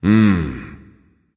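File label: non-verbal agree; smite; voicepack; houyi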